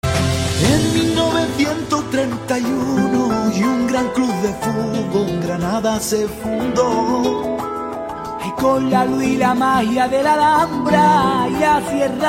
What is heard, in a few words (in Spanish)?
himno; 80 aniversario granada cf